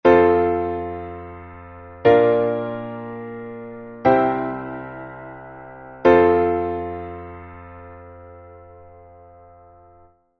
F major three chord

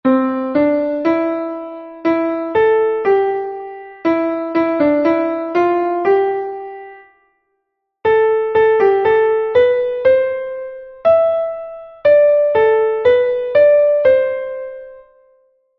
C major key melody

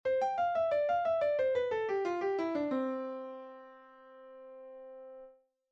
C major random

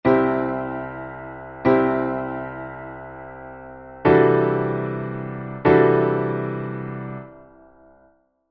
CD polychord

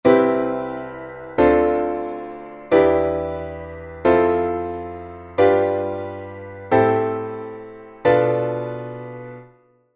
C major diatonic VIIm7-5

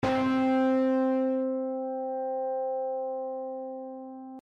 piano C overdrive

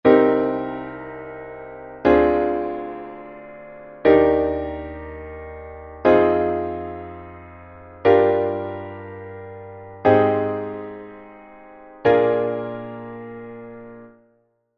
C minor diatonic IIm7-5

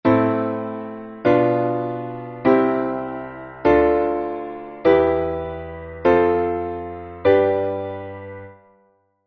A minor diatonic

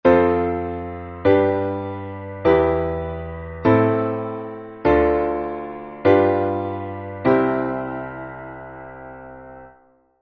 dominant motion

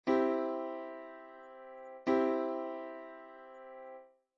C major chord

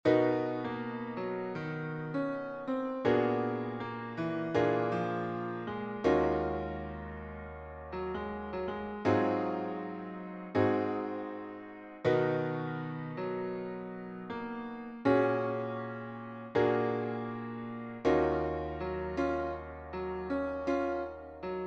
subV7

